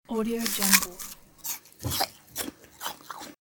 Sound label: eating apple